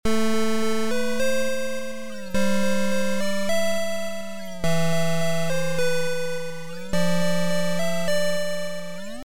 Fortpiano variation

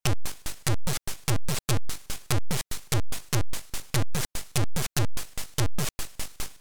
DRUM

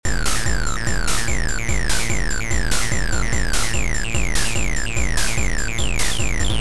BEAT

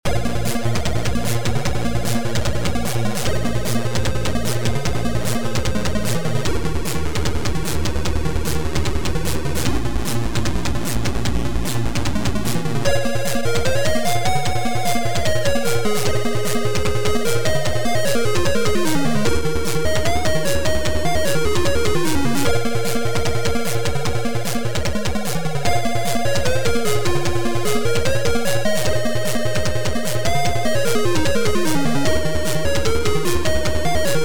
Amiga Chiptune

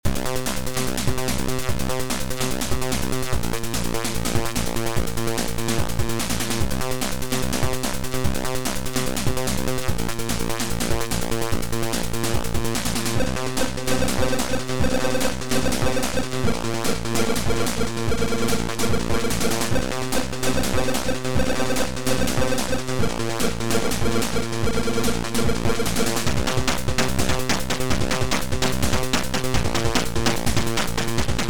RAP HOP

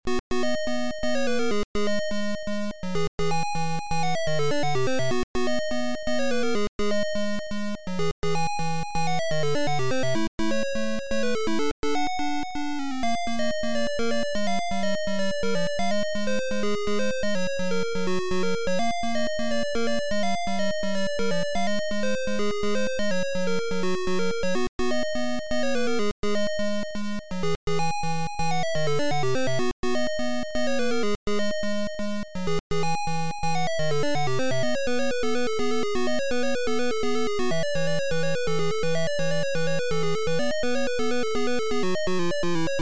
Title AY Beeper; The Arc of Yesod

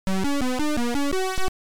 Title Beeper; Magnetron

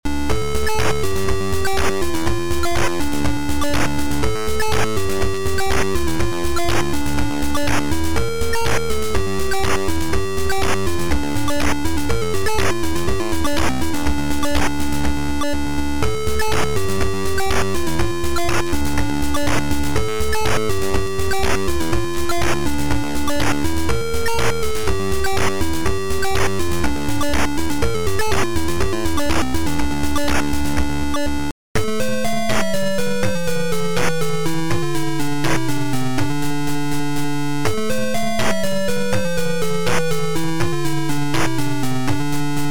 Horror; Kotin Maxim

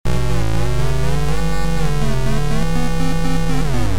Sleep gliss

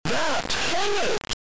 Title AY Beeper; The Arc of Yesod

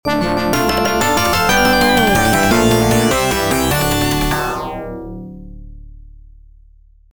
Fanfare; Gryzor87

